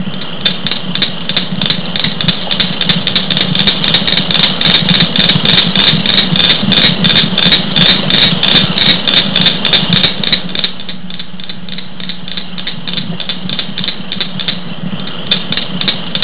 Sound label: cricket frog